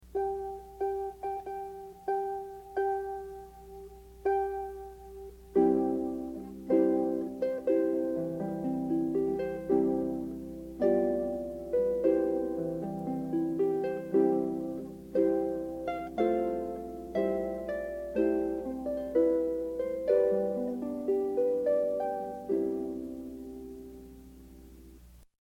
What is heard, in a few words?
bridal chorus